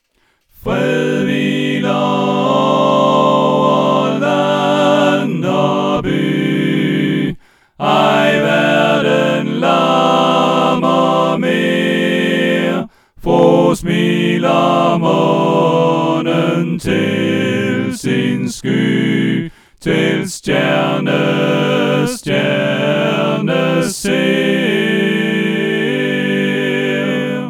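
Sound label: soprano alto tenor bass; Fred hviler (la cour); Stephans-koret; Fred hviler over land og by; oevefiler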